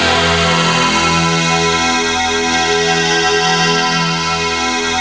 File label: MINIMOOG; Rubber Chicken Software Sample Bank; OLD SYNTHS